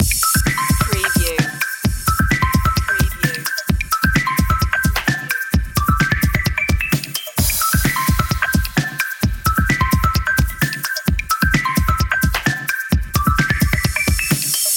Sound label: electro cool loop; media music now